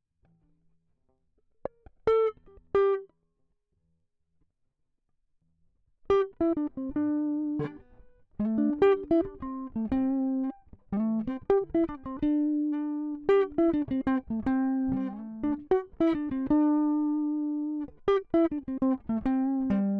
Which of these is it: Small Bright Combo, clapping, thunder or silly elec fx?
Small Bright Combo